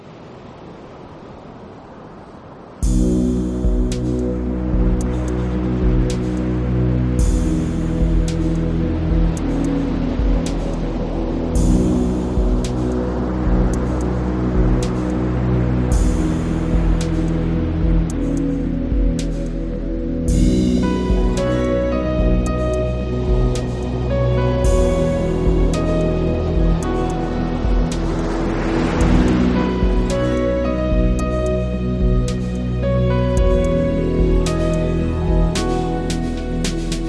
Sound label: Big waves; Relaxation vol.1 - Nature